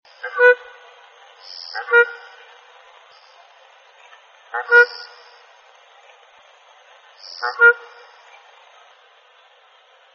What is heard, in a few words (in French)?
tadorne a tete grise, chant